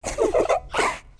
chasing; chiabihamaru; cv project; invading executioner; zerosiki; slender

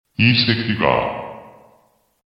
stabbed; hoseong

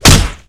hit; slender; yakuza; kiryu

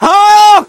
scout pain sharp

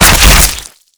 shot hit; silent hill

